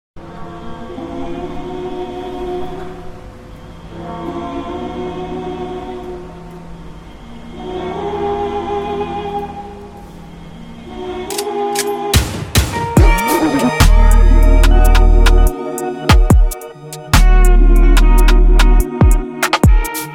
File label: Drill Beat